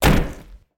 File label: body impact wood